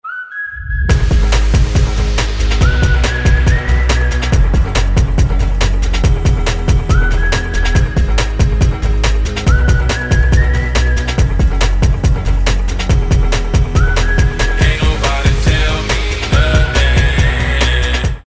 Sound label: BEAT